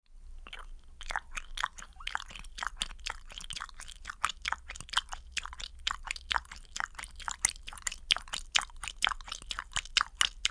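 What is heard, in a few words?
animal drinking water, cartoon sound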